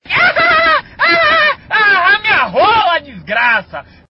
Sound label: hahahaha